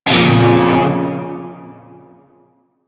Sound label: Shawzin Hirajoshi Chord C; Warframe; Narmer Shawzin